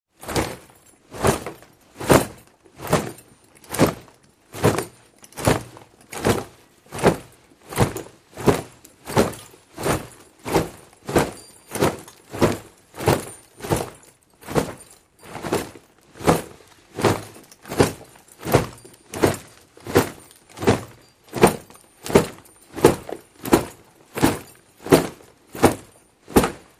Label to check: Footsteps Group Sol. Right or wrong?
Right